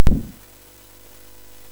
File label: SFX, MOS6581; sid; Narco Police